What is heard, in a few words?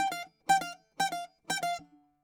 bouzouki